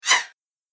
Poltergeist On The Move, ghost

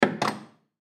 Ship Window Unlatch; Transportation Watercraft

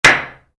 Hammer nail, Hammer: Impacts Nail; Hammers; Tools; Stuart Duffield

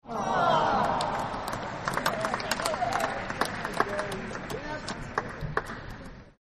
Applause Disappointment

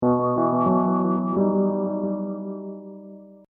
Hip Hop Dark Rhodes Licks 90 Bpm Straight B

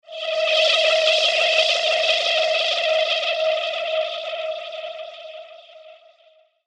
Laser Death Ray; laser gun; Kill Kill Kill - Laser Death Ray; Laser Gun Sound Effects; science fiction sound effect; star wars / trek style games

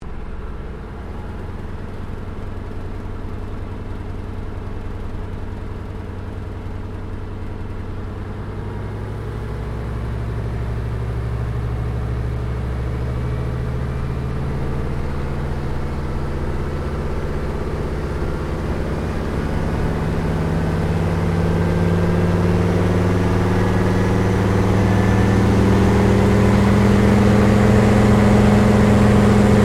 AIRCRAFT CA-13 BOOMERANG TAKEOFF INTERIOR STEREO; Airplanes

